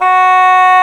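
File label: wind G#3, orchestra; Roland - LA Composer vol. 1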